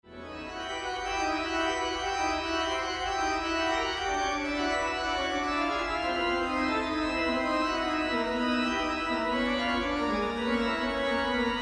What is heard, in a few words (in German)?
Fuge e-moll, Barock Trompete Orgel; Bach; Ausschnitt